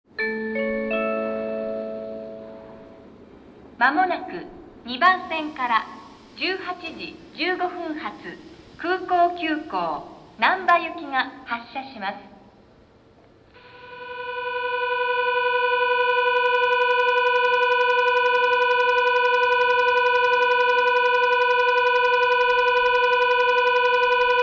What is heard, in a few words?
bell, station